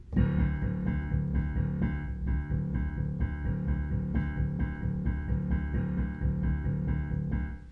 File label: rocking octaves very simple